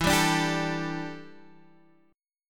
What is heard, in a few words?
D Suspended 2nd Suspended 4th E